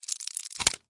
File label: Scorpion Sounds